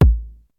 long steel kick drum A key

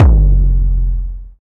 deep tom drum f key; tom drum samples

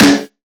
damped steel snare drum C sharp key

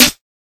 small reverb steel snare drum sample e key

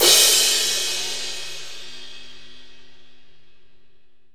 drum crash sample G key

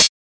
quiet pedal hi hat sample c sharp key; hat samples

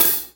short foot cymbal B key; hat; Tuned drums

